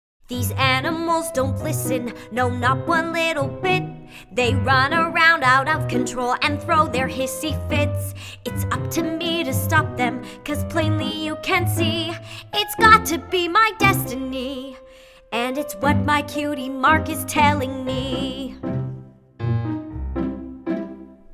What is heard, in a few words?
Piano; My Little Pony Friendship is Magic; Season 3